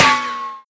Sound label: npc armor, bullet impacts; Half Life Model Pack